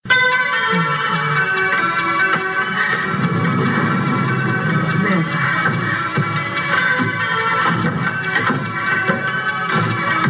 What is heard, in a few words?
dramatic theme